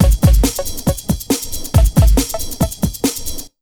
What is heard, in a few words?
Big Beat Drumloops, 138 BT